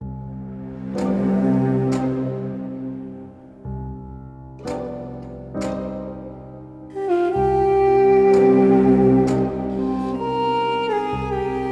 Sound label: Duduk variation